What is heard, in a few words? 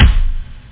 kick; OctaMED SoundStudio V1; jazzies samples; perc